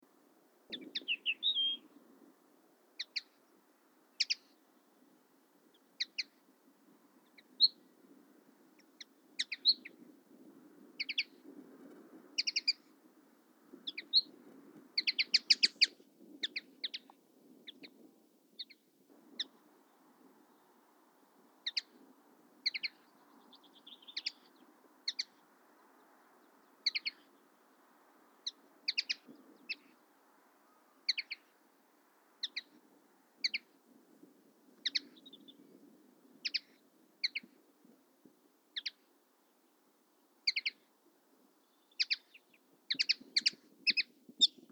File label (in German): Bluthänfling Linaria cannabina